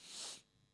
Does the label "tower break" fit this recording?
No